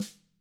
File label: TAMA SNRD, TAM HIT; ILIO; Double Platinum Drums 1